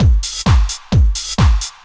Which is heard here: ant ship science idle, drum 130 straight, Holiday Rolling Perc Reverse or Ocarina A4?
drum 130 straight